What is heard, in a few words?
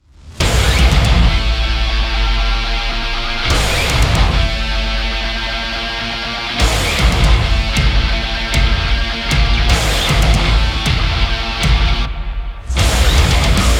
Higain ToneX; Monoscream